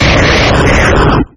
Grito de Electrode

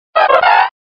Grito de Volbeat

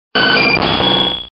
Grito de Skarmory

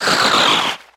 Grito de Snorunt

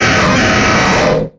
Grito de Aggron

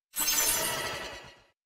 Grito; Orbeetle

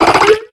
Grito de Meditite

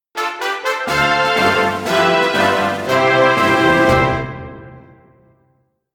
Tema de Victoria; Dragon Quest; SSBU

